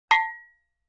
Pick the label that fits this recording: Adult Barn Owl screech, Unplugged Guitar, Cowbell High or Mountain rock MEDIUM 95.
Cowbell High